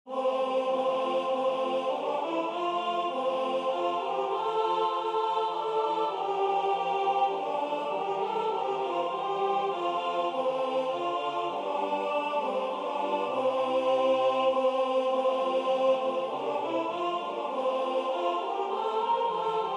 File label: Alto; Away in a Manger (audience)